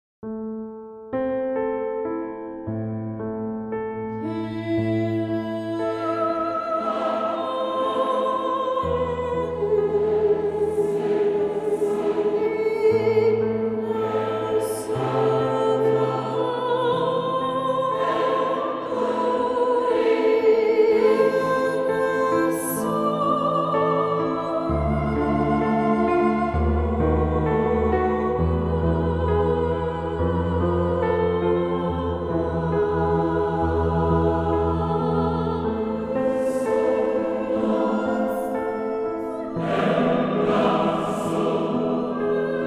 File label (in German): Oper